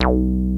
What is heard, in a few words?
303 PLUG, BASSES; Pro-Rec - Synthsonic